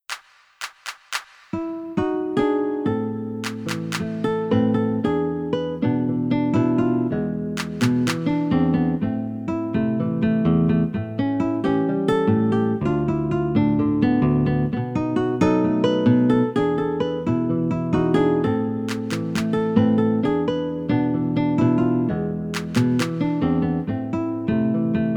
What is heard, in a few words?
backing